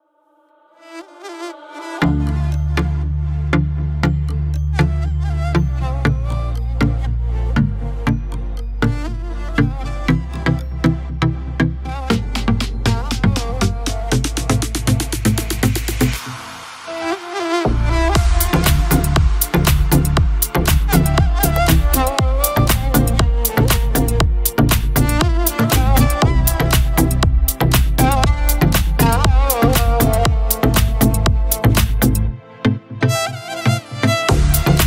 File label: arabic violin